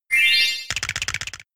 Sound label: tickle